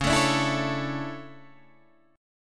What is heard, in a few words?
D Major 7th Suspended 2nd